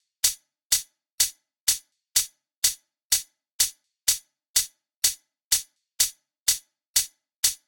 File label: Hat; techhouse